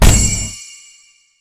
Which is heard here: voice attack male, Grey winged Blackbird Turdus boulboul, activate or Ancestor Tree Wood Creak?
activate